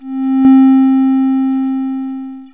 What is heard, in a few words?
tone